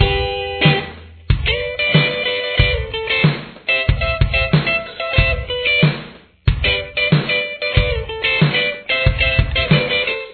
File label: guitar